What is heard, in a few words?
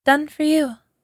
done for you; notification sounds; girl ringtone